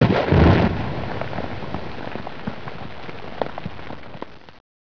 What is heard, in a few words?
STRIKE